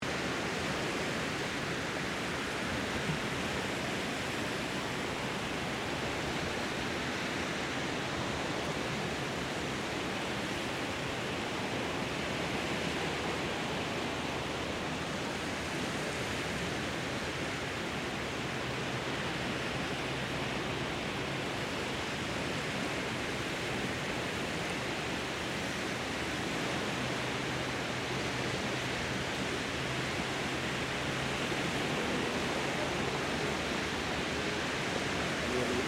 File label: Water Waves On Sand Gentle Loop, Waves at StKilda beach; Ocean; Nature